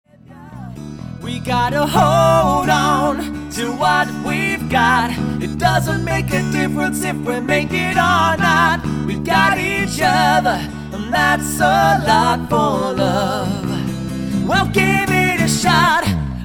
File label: Acoustic Duo LIVE